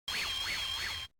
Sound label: cast; fujitsu